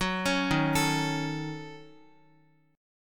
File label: D sharp Minor 7th Flat 5th, Guitar; D#m7b5 arpeggiated; x,x,6,6,3,5; 4F,4A,5C,5G,5C,6E